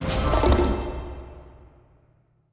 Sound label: win small; serengetikings